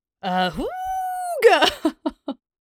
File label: Ahoogah; Foley; The JV Club wih Janet Varney